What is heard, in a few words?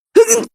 damage